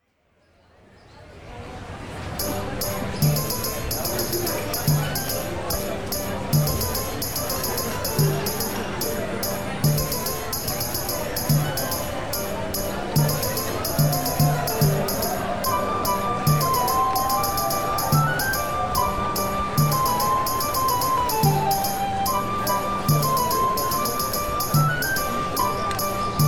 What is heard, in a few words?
Soundscape Version; Market; The Legend of Zelda Ocarina of Time